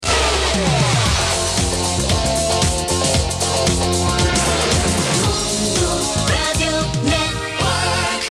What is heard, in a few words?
jingle; Mondoradio